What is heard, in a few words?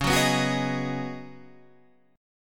C sharp 9th Suspended 4th C sharp down Guitar Half Step Down, chord; C#9sus4